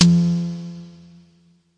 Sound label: electro tabla